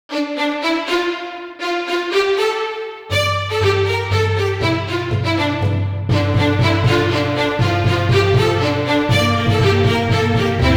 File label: no chime standard ending